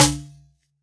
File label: drum, mixed drums, World Instruments